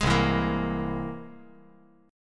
C Augmented 7th C down 5G, 4C, 5E, 5A

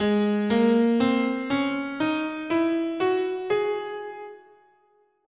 Fifth Mode, scale, guitar; Gsharp

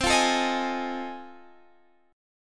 F 7th Sharp 9th C

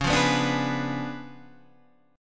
Db 7th Flat 9th, Guitar, Standard; Db7b9 strummed; JGuitar

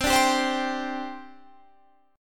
C Suspended 2nd, C, down, Guitar, Half Step Down

